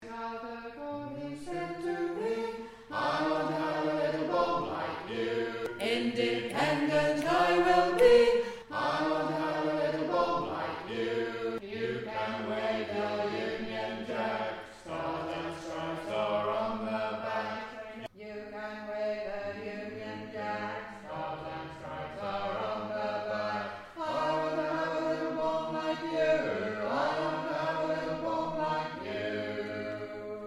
Bass

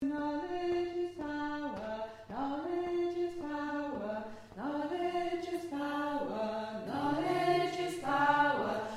bass

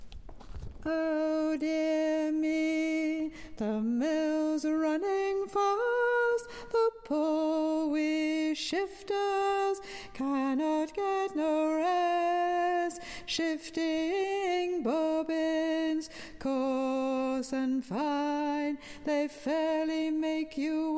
Bass

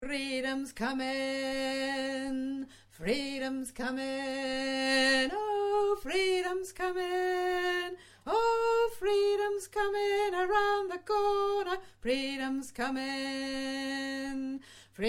solo bass; freedoms coming

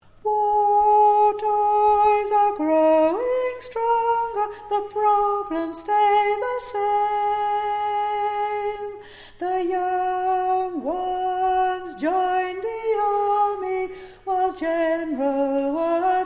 Verse Tune

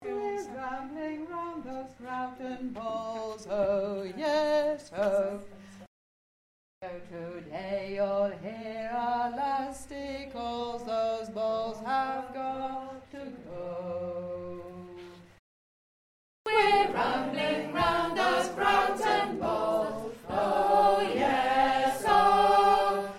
Harmony

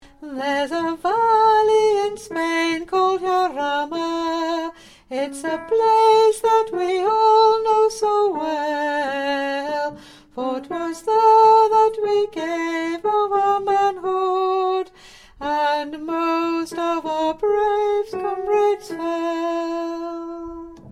Alto

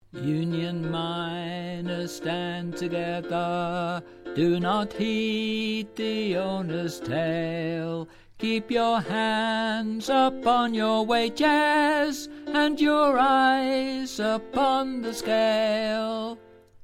chorus tenor

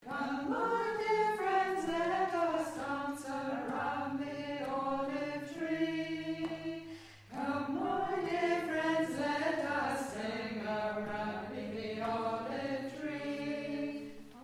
Alto Chor

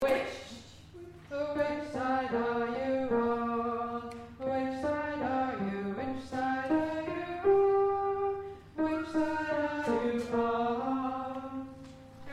Alto Chorus

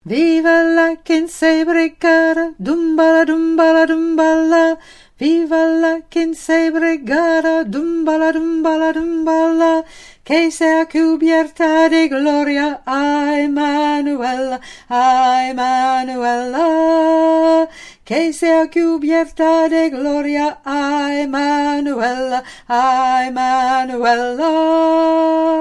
Tenor

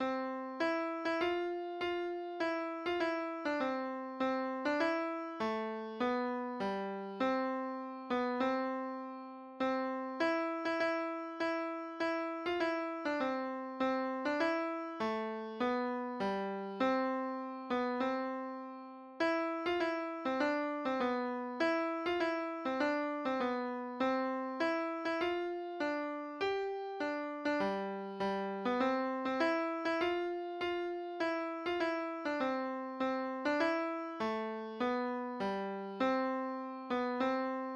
Alto Midi

